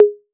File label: TOMS 808